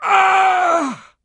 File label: wound, stalker; monsters